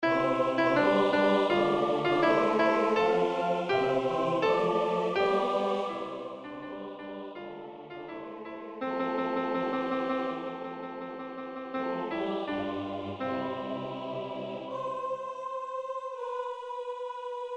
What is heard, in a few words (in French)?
Alleluia SATB; Alleluia de Boyce